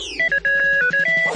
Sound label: Sms iPhone; Totally Spies